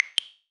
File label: inapp notification